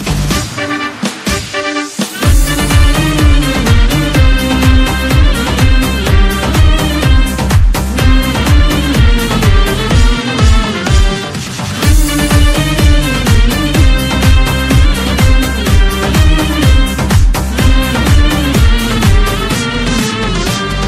Mehter; 2018 Afrin; meloboom

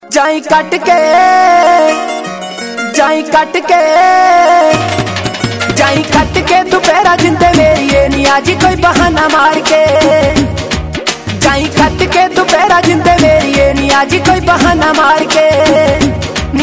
Punjab

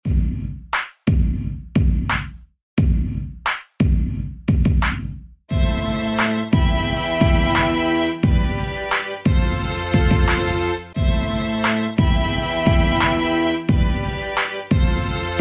Rap Beat

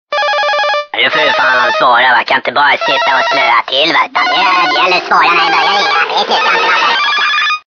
Annoying Tone

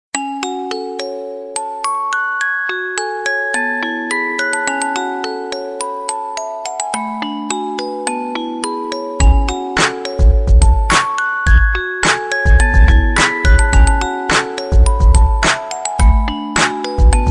Break Dance Beat

